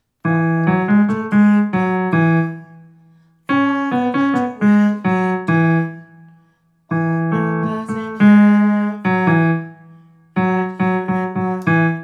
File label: Tenor piano breakdown; summer choir